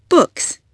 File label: books